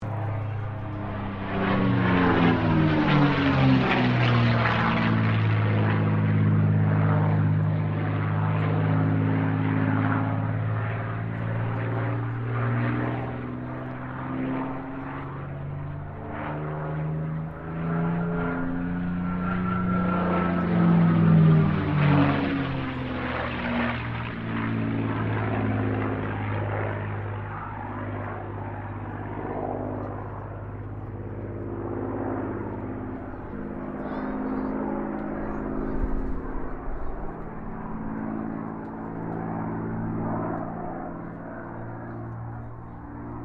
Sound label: AIRCRAFT CAC CA-18 MUSTANG MK23 LOOP; CA-18 /P-51 Mustang; Airplanes; Vehicles; Aircraft Collection; Twistedtracks